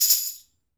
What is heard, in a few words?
TAMB